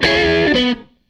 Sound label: Tele Guitar Loop